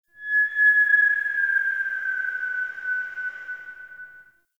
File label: Interior Stadium Feedback; Warframe; Ambience; Nineteen Ninety Nine